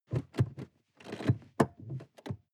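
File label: sports car interior seat handling long; verkehr transport; autos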